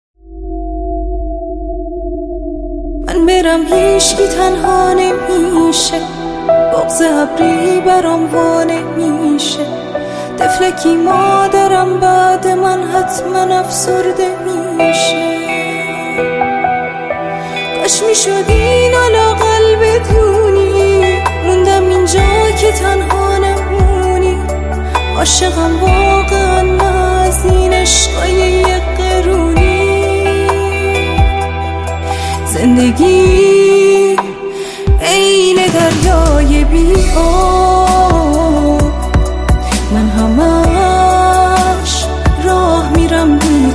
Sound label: ghamgintarin ahange donya